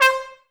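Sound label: brass trumpets C; Miroslav Vitous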